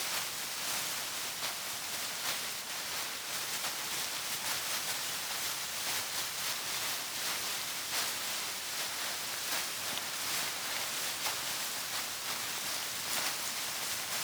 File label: foliage slide loop